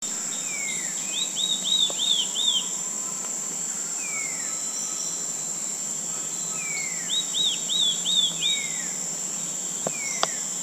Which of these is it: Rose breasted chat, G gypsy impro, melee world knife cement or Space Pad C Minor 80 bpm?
Rose breasted chat